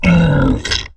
die, aliens; ortnok

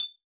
beep; ufoai

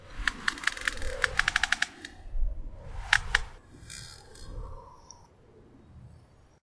creak tree

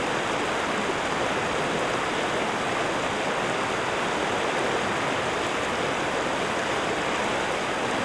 river; loop

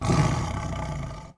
tiger snarl

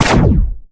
plasma medium; ufoai; weapons

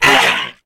hurt, aliens; ufoai

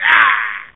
male hurt

